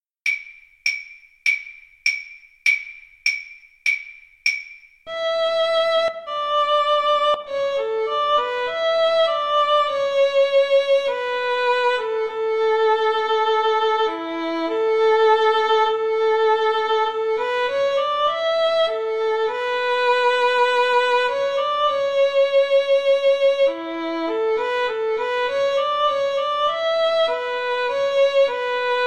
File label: rule britannia, Violin Cello Duet